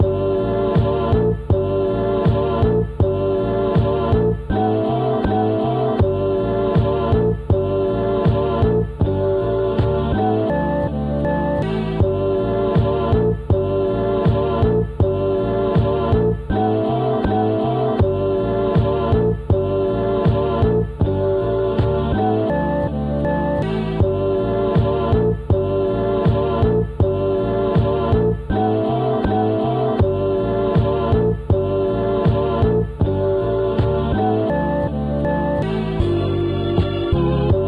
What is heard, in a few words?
80BPM Loops